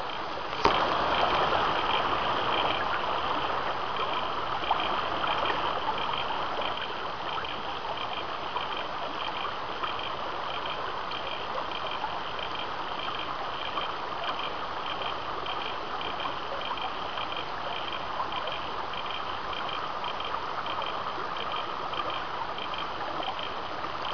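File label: litoria wilcoxii, frogs; Stony-creek Frog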